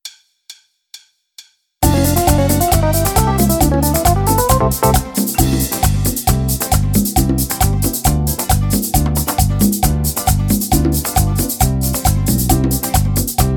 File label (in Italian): TIRAMISU Merengue BASE